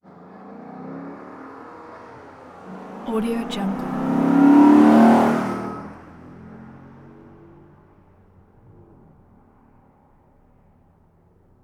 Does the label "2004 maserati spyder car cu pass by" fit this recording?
Yes